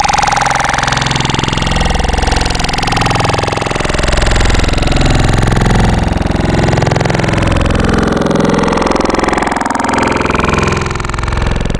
Heavy Fart, Wavetables; Cymatics; Secrets Wavetables; Serum

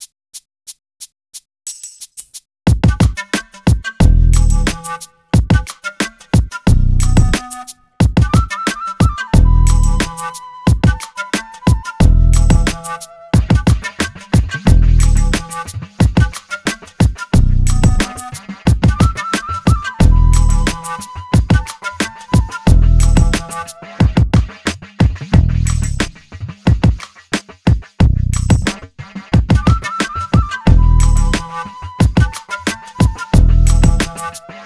090 Emin, Hip Hop Exotica, Apple Loops; Big Fish Audio

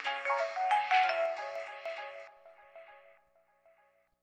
Stab; Thirty-One Flavors

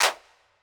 Clap Hit; Hip Hop

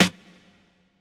Snare Hit; Hip Hop Exotica; big fish audio; 096 Cmin

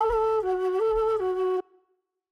Flute B, Hip Hop Exotica; 075 Bmin

